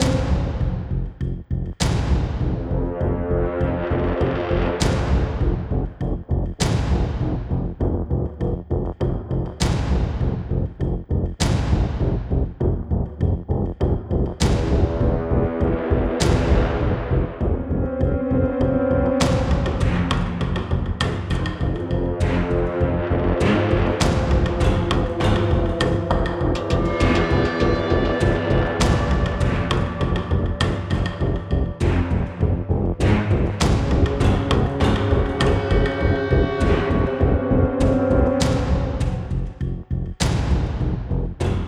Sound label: Danger LOOP; DarkLord; dead in time windows gamerip 2018